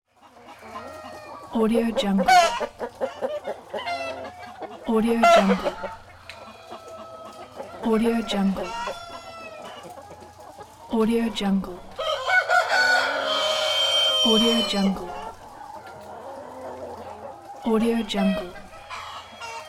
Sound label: chicken house; applehillstudios